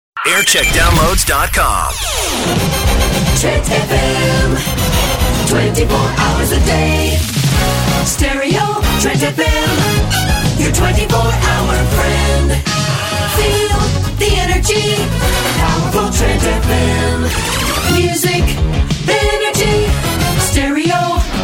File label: Jingle Montage; Trent FM; Century 21